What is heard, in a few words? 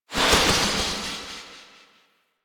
Impale Cast Release Tail; Warframe; Hoplite